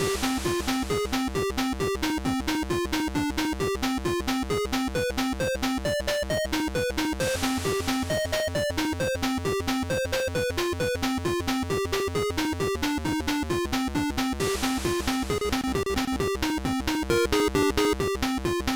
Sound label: 8-bit